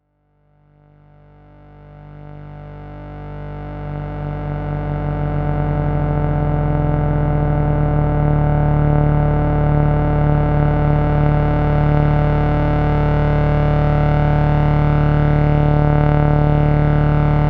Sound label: dry double SAW; spectrum